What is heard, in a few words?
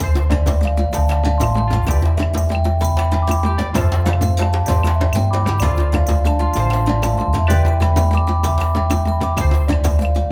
Afro Percussion; L4C STUDIOS